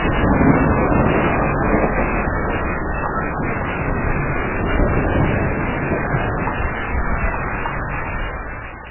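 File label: ocean distant noisy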